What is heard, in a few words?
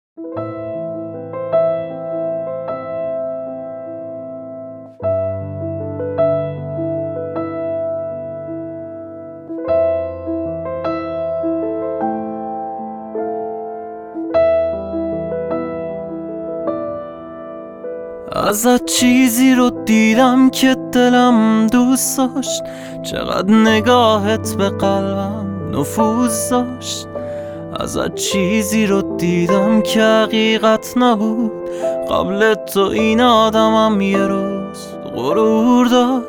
(Acoustic Version)